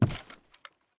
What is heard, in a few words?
wood footstep